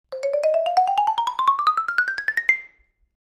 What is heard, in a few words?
Xylophone Lng Ascend, mallets xylophone